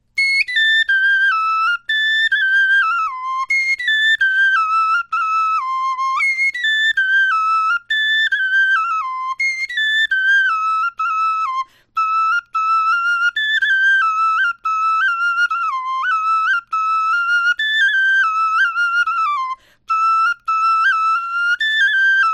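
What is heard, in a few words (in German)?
melodie, Knochenflöten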